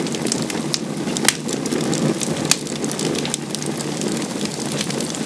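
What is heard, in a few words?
fire small loop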